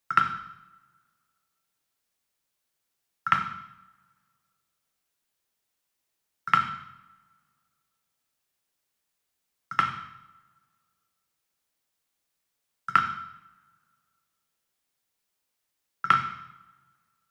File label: WOOD PERCUSSION Wood Block Standard Resonant Flam Medium; boom library; Cinematic Strikes Construction Kit